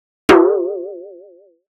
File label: Metal Hit Pulsating Hit Normal Pulsating; Hollywood Edge; sound designer's toolkit v2